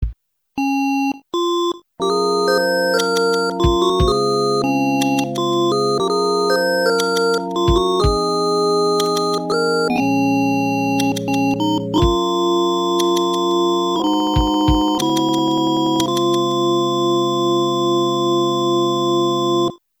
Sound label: Fall, Amiga; A Train; Moonchild